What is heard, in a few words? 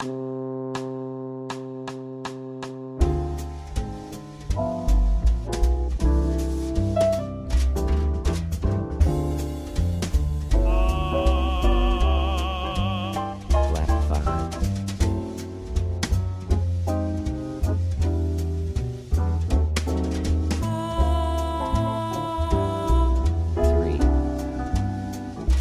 Straight Med; jazz standard ear training